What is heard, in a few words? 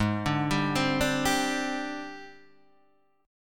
Ab Major 7th Flat 5th Ab, down, Guitar, DADGAD